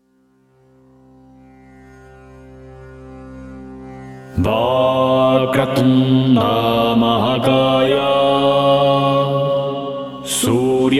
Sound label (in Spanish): VAKRATUNDA MAHAKAYA Ganesh, mantras ganesha